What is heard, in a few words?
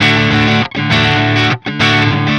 Electric Guitar, Loops; Guitars